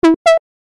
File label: mini brass